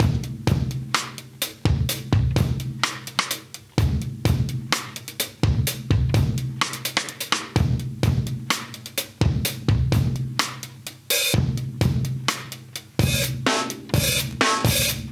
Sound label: Live Breaks, 127